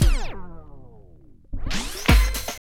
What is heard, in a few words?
SCRATCHES